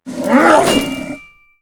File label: Attack; ShadowRook